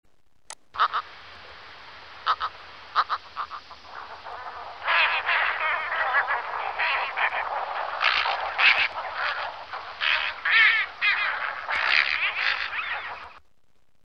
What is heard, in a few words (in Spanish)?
garcilla bueyera